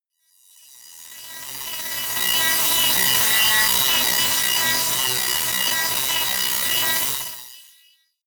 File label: industrial riser long; risers